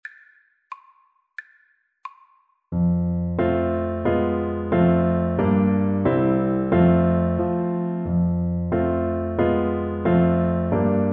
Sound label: Bassoon